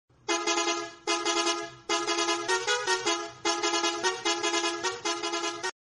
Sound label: volvo bus horn bus horn message